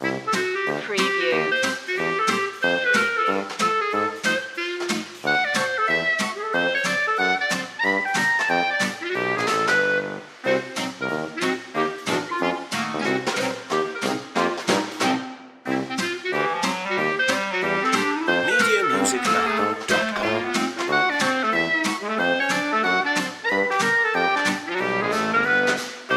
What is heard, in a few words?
dewdropper loop